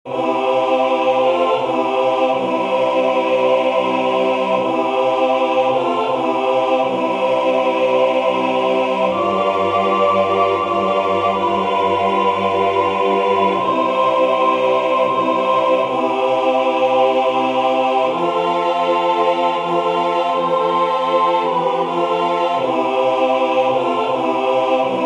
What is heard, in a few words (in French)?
Douce Nuit Tutti